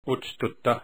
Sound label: cough